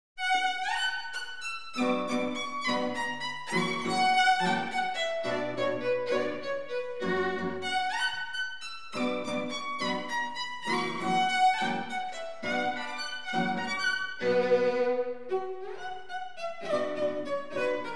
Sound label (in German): Violine, Gitarre Und Violoncelllo; Quartette Mit Gitarre; La Campanella; op. 4, 3